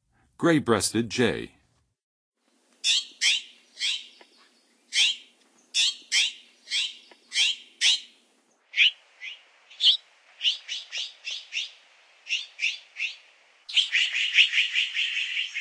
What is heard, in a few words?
Gray-breasted Jay, Songs of Birds; North America; Western USA; Western USA Songbirds